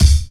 Trebly Kick Single Hit D Key, Royality free steel kick drum sound tuned to the D note